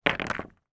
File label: sport pool snooker handfull balls place in rack triangle